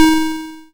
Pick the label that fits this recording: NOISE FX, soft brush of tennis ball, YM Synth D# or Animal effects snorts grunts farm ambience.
YM Synth D#